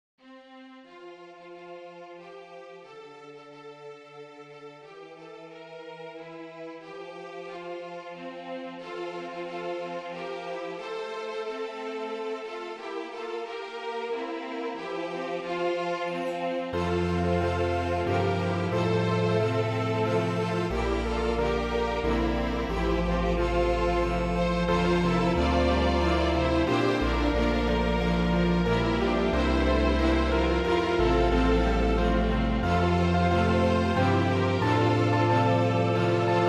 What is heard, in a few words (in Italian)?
Percussioni